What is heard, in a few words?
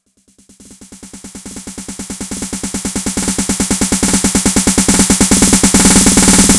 Beat 140; Audacity; loops